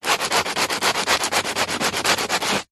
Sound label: industrial sanding large piece of wood sand paper fast